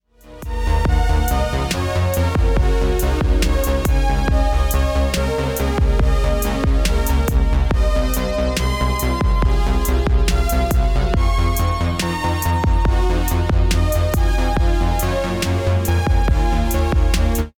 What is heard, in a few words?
hardware